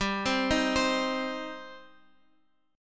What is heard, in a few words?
G Suspended 4th Sharp 5th, Guitar, Open F; Gsus4#5 arpeggiated; JGuitar